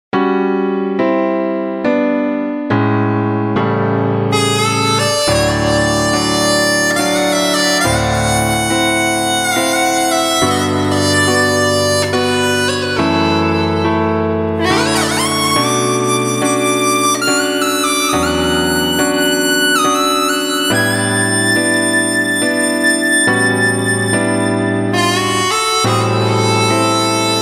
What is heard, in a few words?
Amazing Crom Harp